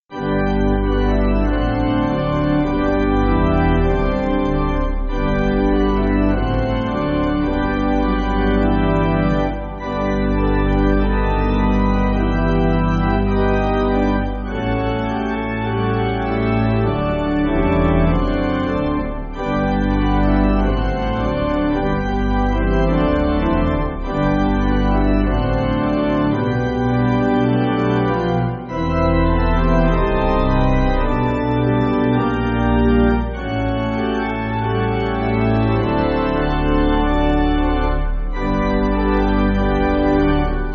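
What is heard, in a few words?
Pipe Organ, Music Backing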